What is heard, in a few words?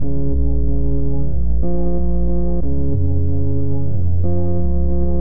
loop